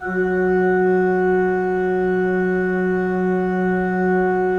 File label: 3D Organs Pipe Mix Celeste